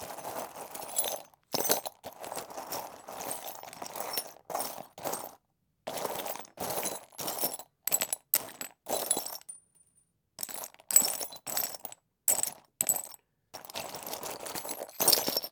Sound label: screwbox; best service Blue Box; mixed sounds long